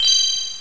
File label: grab collectable; SuperTuxKart